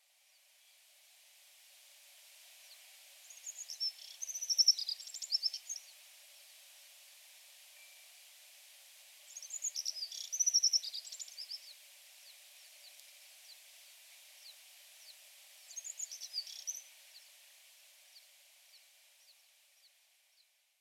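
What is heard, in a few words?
Alpine Accentor; iwahibari; norikura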